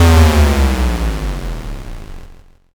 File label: explosion l